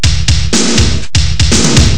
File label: rock